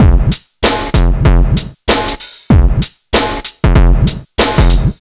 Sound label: 96 bpm drum loop f key, kick tuned to the F note